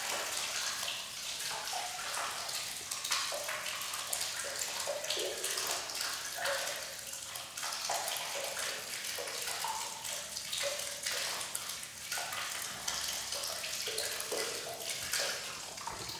water underground sewage duct water running medium distance mono; ambiance